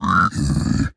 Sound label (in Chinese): 猪灵蛮兵愤怒声